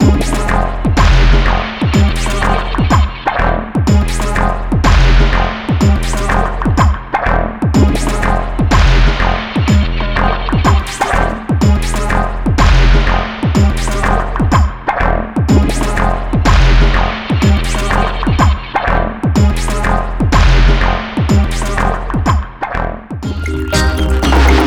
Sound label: Future Dub; renegade audio